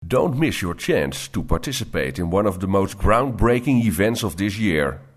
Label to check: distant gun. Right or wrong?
Wrong